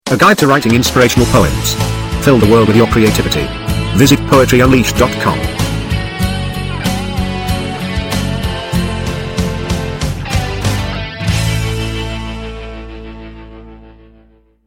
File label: Inspirational; Guide